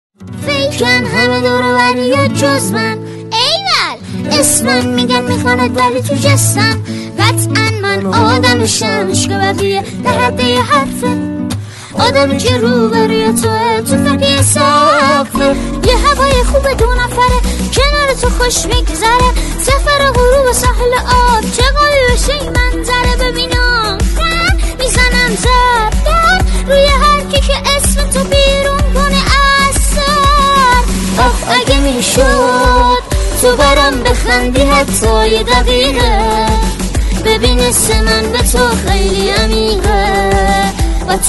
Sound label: Romantic